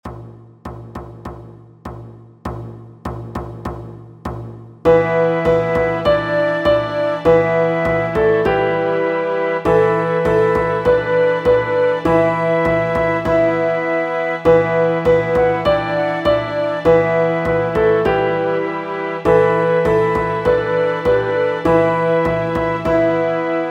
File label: Medieval Magic